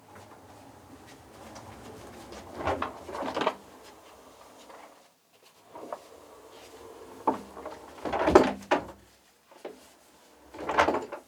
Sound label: Moving Object On Rolls